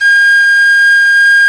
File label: P WHISTLE, Old World Instruments, Winds; E-MU Producer Series Vol. 7; Penny Whistle